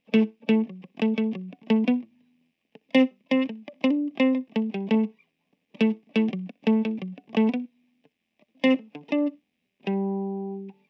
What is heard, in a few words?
085 Pop Guitar S-Note A maj, Electric; Pop Rock; Electric 055-099 bpm; Pro Tools Essential Loops; Digidesign